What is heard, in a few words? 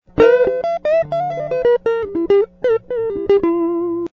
guitar